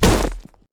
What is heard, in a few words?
yeti vs stone; nunu